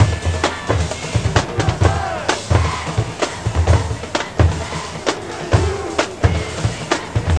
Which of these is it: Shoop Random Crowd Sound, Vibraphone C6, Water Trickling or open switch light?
Shoop Random Crowd Sound